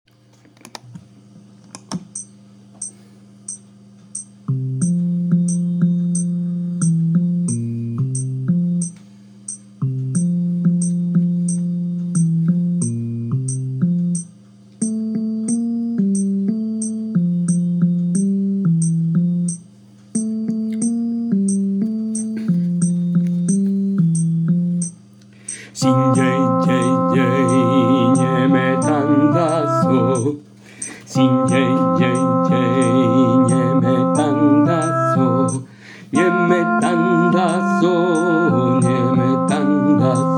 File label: Contralto